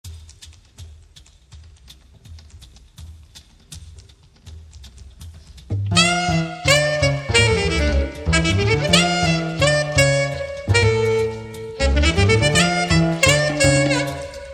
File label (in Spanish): Buleria live, flamenco sax